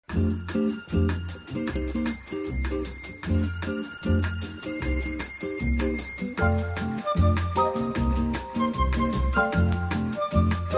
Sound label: bossa nova instrumental; sampler bossa nova